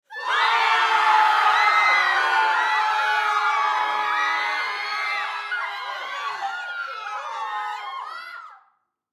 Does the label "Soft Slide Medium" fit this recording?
No